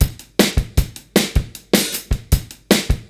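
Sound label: 155 bpm, beat loops; Zero-G; Monster Beats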